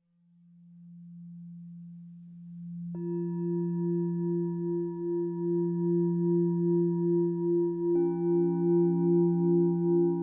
Improvisation for the fourth chakra, Singing Bowls